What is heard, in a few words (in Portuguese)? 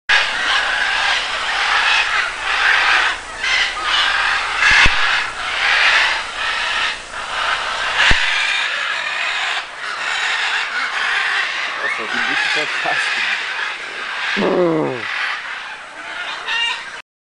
canto do casuar